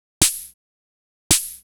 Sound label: Snare, Trance